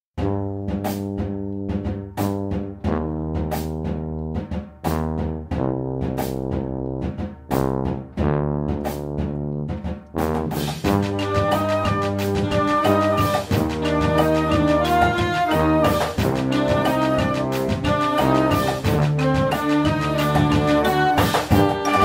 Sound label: Hip Hop Stadium Blasters, Marching Band; Geneva